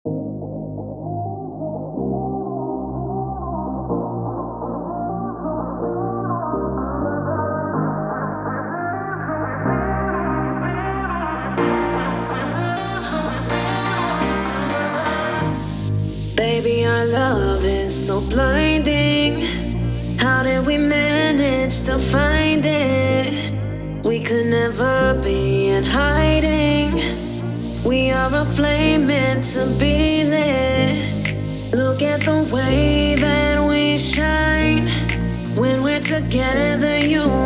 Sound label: Piano